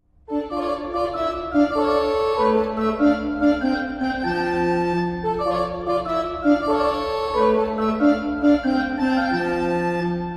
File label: violin organ